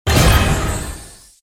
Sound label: UI Super Win